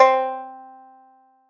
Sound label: Dobro C3; Guitar & Plucked